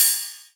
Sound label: Rides, Cymbals